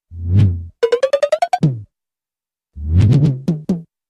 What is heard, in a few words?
Lowpitched Synthy W, crashes